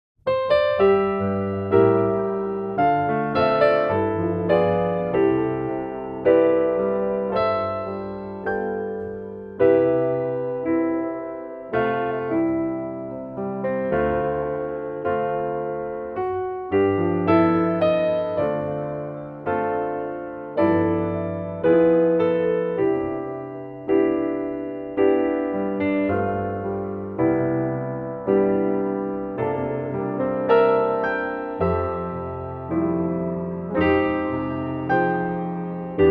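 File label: piano accompaniment in C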